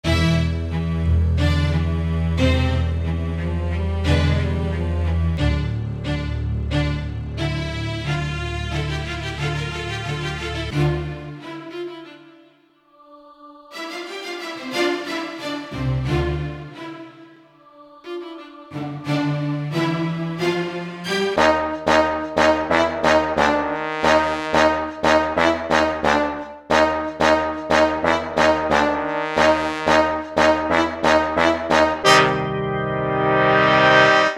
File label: orchestra